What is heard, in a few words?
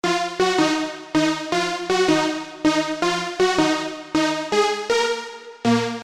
Lead